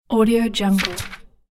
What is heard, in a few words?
turning a page; catsoundstudio